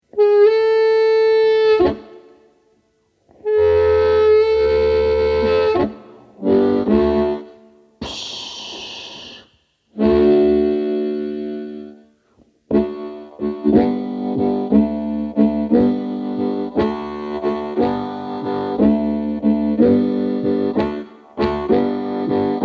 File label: Train Solo, blues harmonica; Shure 52 (1947)